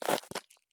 turn gravel; Escape from Tarkov

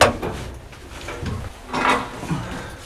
Vehicle reloads; Red Orchestra: Ostfront 41-45

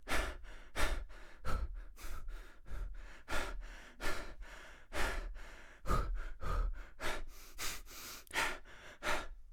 breath sprint; Escape from Tarkov; usec2

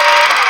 minigun spindown; Modern Warfare

